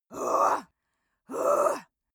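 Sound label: female angry, screams; schreie; menschen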